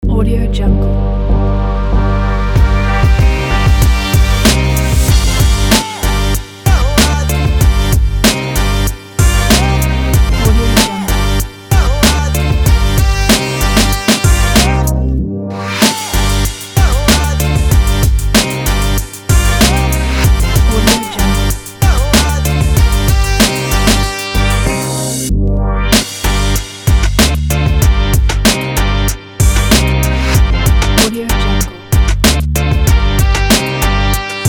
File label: hip hop